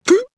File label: gulp